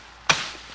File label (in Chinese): 砍草